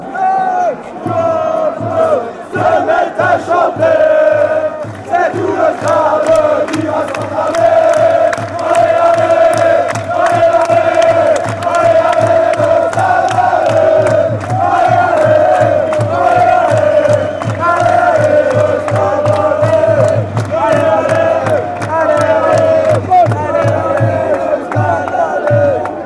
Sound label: singing, chants